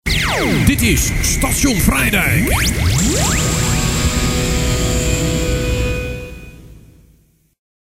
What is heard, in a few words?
jingle; Station Vrijdijk